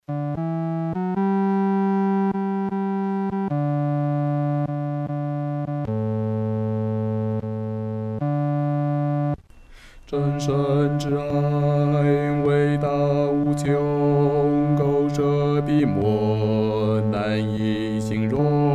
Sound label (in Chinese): 独唱 第四声